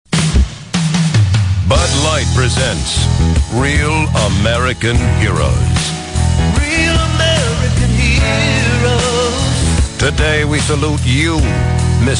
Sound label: bass